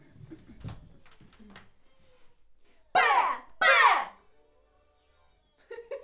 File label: Schoeps AKG, piano